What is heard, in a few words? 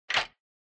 Retro Anime Switch Mid